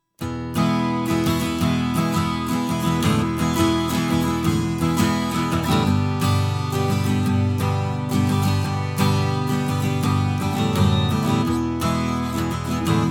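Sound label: Guitar, Niere Preamp; Orpheus 0dB; Preamp300 Output voll Input gering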